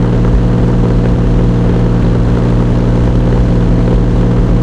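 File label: comet engine interior rpm 99 load, tanks; engines; War Thunder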